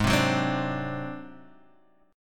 G sharp 7th Flat 5th G sharp down Guitar Half Step Down, chord